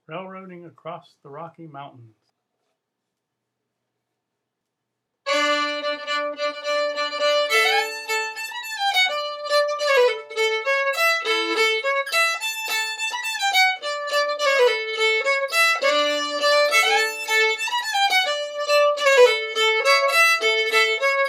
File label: fiddle; festivaltunes